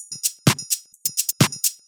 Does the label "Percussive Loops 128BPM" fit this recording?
Yes